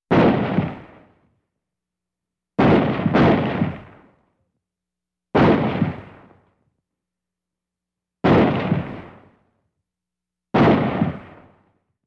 Semi-Automatic Gunshots

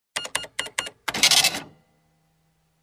Entering one item and printing receipt

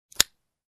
Lighter

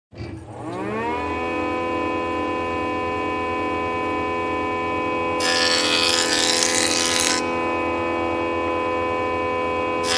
Construction

